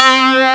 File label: Metal Keys, Amiga Soundtracker; ST-XX Amiga Soundtracker Sample Packs